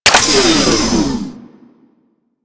Arch Rocket Crossbow Power Down, Weapons; Warframe; Archwing

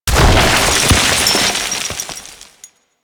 Moon Bolder Crack; Ambience; Warframe; Orokin Moon

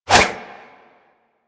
Dagger Whoosh; Dual Daggers; Weapons

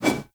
One Handed Axe Whoosh; Weapons; Enemy; Axes; Sinecure One Handed Axe

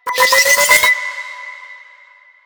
grenade warning; Enemies; Soldiers; Weapons; Warframe; Lasrian; Goonadier

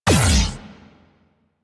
Gatling Laser Shot; Railjack

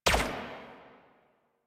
Drone Fire; Warframe; Caliber Chicks minigame

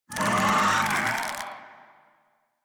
Desert Skate Vocal Death E; Warframe

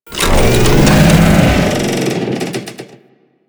Thumper Charge Groan C; Warframe; Grineer Thumper Bot

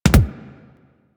Weapon Punch Double

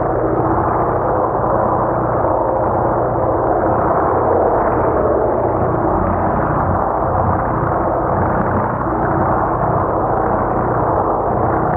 Water Pump Flow Loop; Ambience; Props; Warframe; Grineer Forest

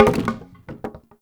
Wood Boarded Wall Medium Destroy Chunk; Objects; Destructible Barriers

